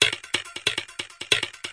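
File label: 138 bpm, sharp percussion, drum loops and breaks; 131 to 140 bpm; samples-ghost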